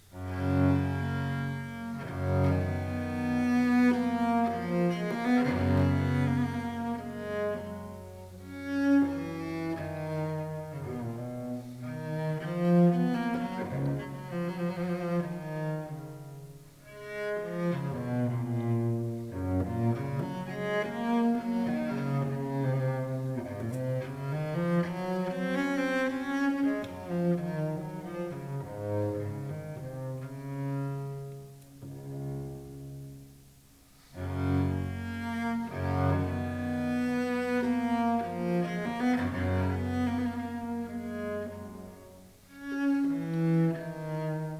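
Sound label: Sarabande, Bach's Suites for Cello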